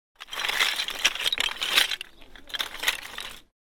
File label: Oyster shells; whitstable